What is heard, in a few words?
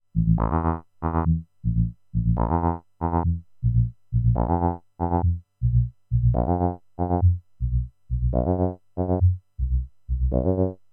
drift SLEW; Snazzy FX; telephone game